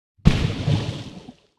torpedo; World Of Warships

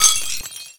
glass shards; Call of Duty Modern Warfare; physics

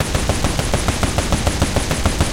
loop oerlikon; guns; tanks; War Thunder

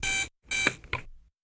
gun reload; World of Tanks

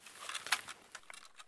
weap out, weap; Escape from Tarkov

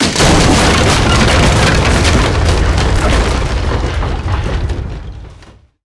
tanks explosion wood; War Thunder

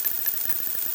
mg shell loop

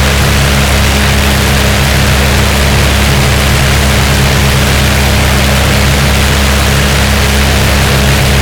Aircrafts Engines DB605; DCS

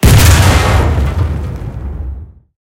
tank hit med; tanks; War Thunder

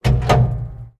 turret klank; tanks; War Thunder

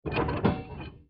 damage vehicle; World Of Warships

